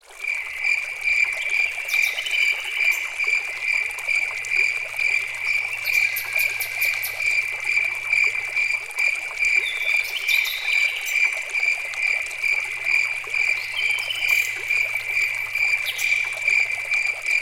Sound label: gentle river stream and crickets pure nature sound; green soundscapes